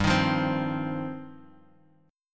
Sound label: G 7th Suspended 4th Sharp 5th, Guitar Drop D